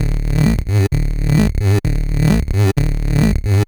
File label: MiniBrute Loop, 130BPM; musicradar; bitcrushed; Crushed Loops